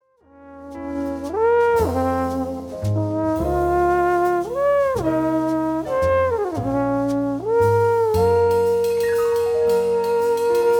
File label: Cruise Blues